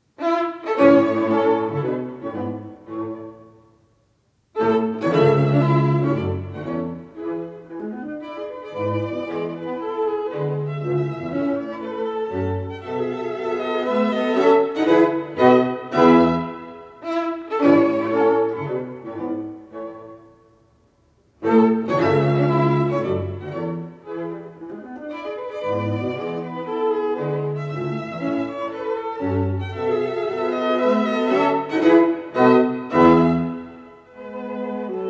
String Quartet No. 15 in A Minor, Alla Marcia, Assai Vivace; Op. 132; Più Allegro - Presto